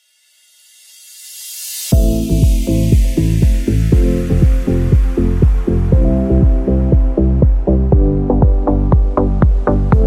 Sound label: Summer Chill House